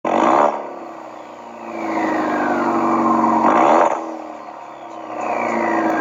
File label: EcoBoost Mustang